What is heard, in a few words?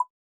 blip; minecraft